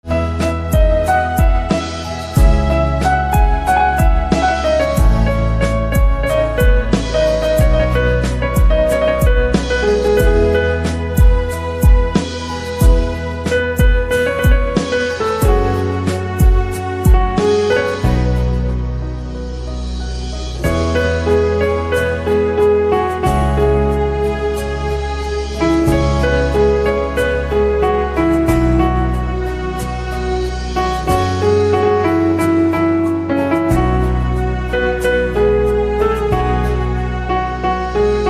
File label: Piano